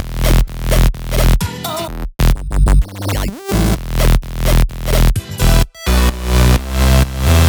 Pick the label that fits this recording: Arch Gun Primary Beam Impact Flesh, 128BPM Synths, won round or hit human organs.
128BPM Synths